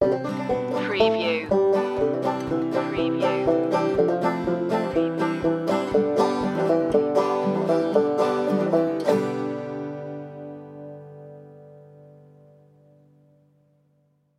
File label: turkey shoot